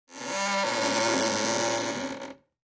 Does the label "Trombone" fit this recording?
No